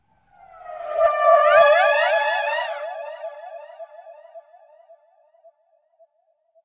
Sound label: Nightmare Appears